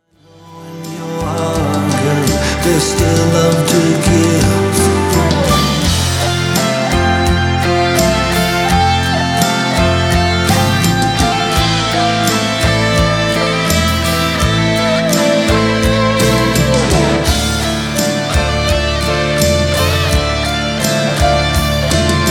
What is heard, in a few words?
GTR SOLO